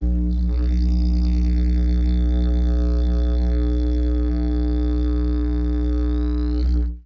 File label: organic didgeridoo elongated